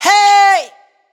adlib "Hey", wet vocals; Slate Digital Omnivox Vocal Sample Pack; Adlibs

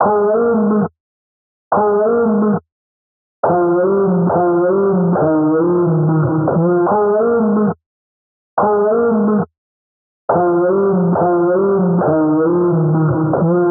vocal chop, 140, Fm; HoldMe; Slate Digital Omnivox Vocal Sample Pack; Chops

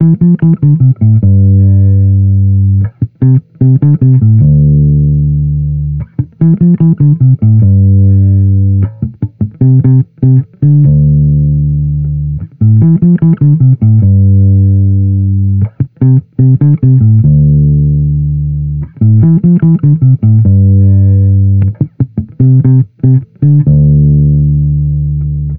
Amped loop 150 Dm, Electric Bass Loops; Slate Digital BASSHOLE Sample Pack

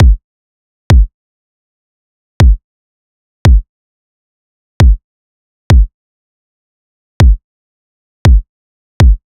kick loop 100; Pop; Drums; Kick Loops; fern; Slate; SD PASADENA Pop Sample Pack

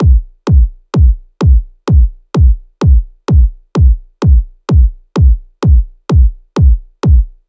kick 128, Kick Loops; Drum Sample Pack; SD SEISMIC; Slate

